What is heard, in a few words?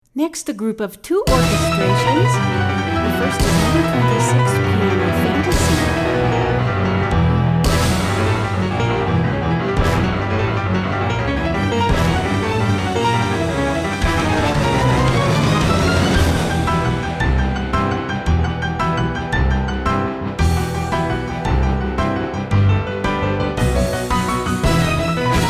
Piano Fantasy